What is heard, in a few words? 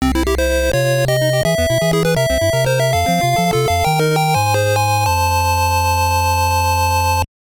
Game Clear; Adventures of Lolo 2; NES